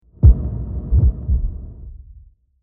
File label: Thunder; QB64PE 4.2.0